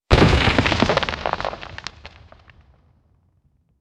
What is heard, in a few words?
a deep crack echoes as, stone